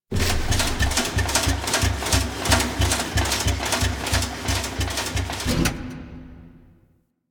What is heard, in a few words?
Misc Machines, Asteroid; Ambience; Warframe; Grineer Asteroid